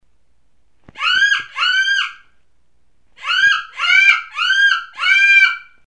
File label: rabbit